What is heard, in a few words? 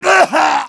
death; mohaa; dialogue